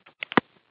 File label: phone click; percussive; REMIXABLE COLLECTIONS; Ze Franks Pain Pack; pain pack percussive and bips and clicks